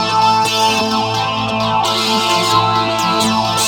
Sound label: Synth Hi Turn; Sonic Foundry (Sony Creative Software); Knutrix Drum & Bass